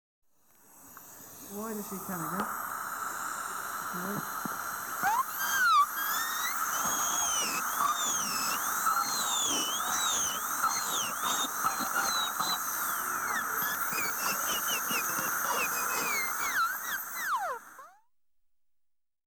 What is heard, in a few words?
AT THE Dentist, High Speed Drilli; BBC Sound Effects Library